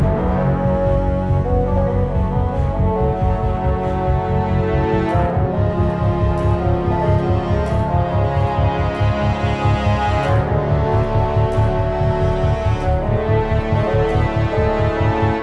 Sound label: Battle Loop; Infinity Blade 3